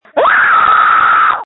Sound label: Scream